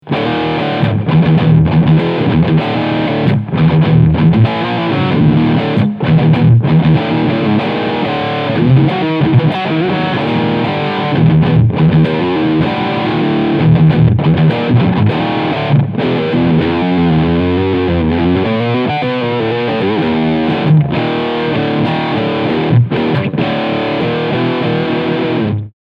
Active White Riff Sound Steg Pickup; Harley Benton SC Custom II

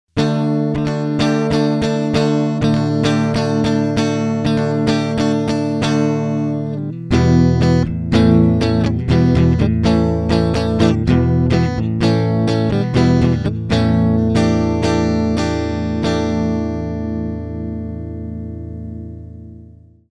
AMS Neve 1073 dpx Gitarre mit EQ ohne HPF

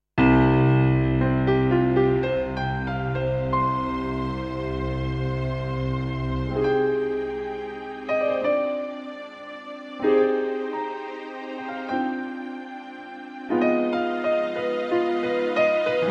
J7 VIVO und Strings

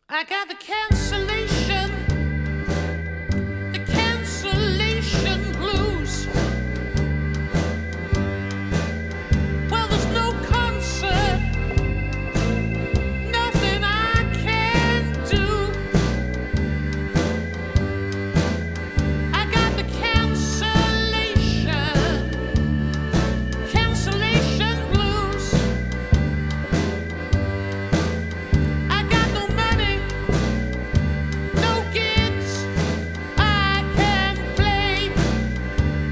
Blues trocken; Cancellation

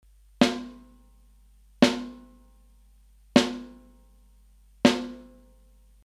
Snare Fell Mitte; Kirchhoff Test

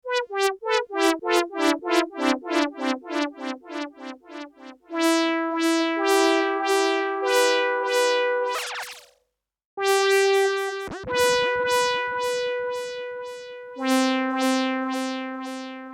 Malekko Manther Delay und Freeze

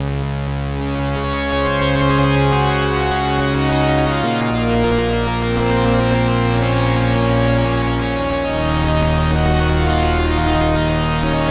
OB-X8 Brazzelblaeser mit viel Modulation

